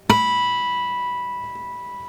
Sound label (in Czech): flažolet 7 fret; KMITÁNÍ A VLNĚNÍ; hudebni nastroje; CHORDOFONY